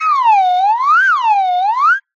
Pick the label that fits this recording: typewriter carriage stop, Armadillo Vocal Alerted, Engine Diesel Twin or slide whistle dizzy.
slide whistle dizzy